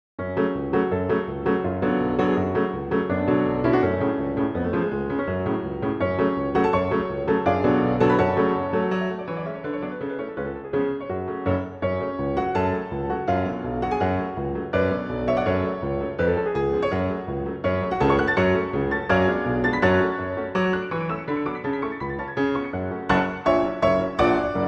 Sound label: Allegro; Brahms; Hungarian